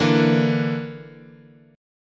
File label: D Suspended 2nd Sharp 5th, chord